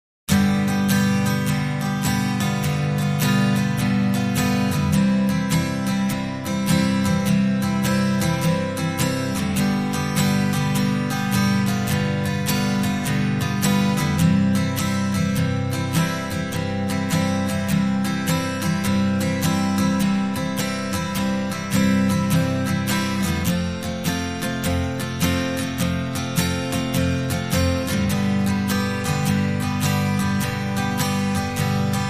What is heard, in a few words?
Guitar Strums